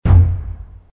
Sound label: hitech stop, door; movers; Soldier Of Fortune II Double Helix; XBOX; doors